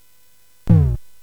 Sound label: Dark Castle, CSG8580R5